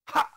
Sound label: punch